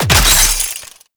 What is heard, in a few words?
Pistol Reload; Temple Signature; Warframe; Tenno